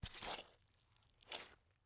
Liquid Water Towel Stepping On Wet Towel

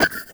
Buttons Mechanical; Multimedia Internet CD-Rom Flash

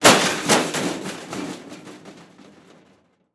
Crash Metal Tumbling; crashes; AdobeCC

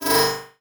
Multimedia Internet CD-Rom Flash Ding

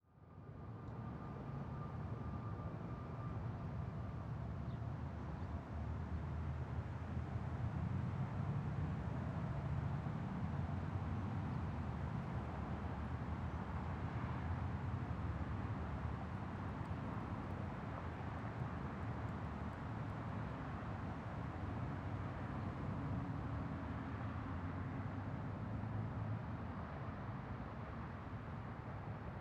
Ambience Traffic Under Road; AdobeCC

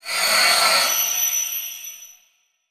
Transition Metallic Swell; Production Element; Title Transition; AdobeCC

